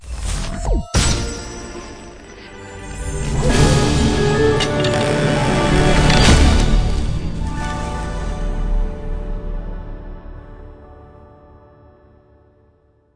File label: average Win Pick; fairyhansel